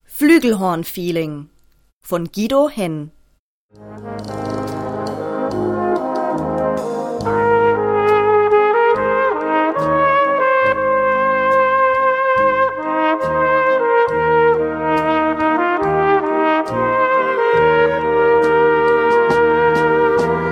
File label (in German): fluegelhorn